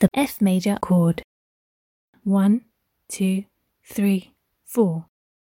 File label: f major chord